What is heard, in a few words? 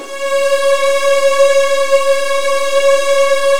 C#2, Virtuoso Strings; ILIO; Kirk Hunter Virtuoso Strings VOL-1